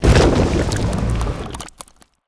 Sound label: ABYSSM attack